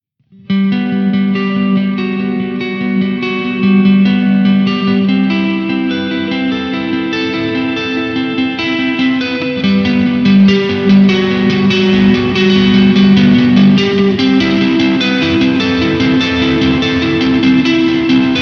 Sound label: arpeggio